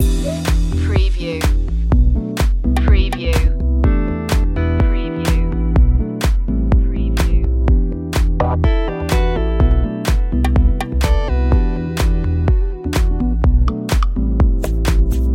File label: loop, chill